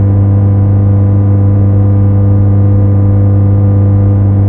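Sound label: power transformer hum distant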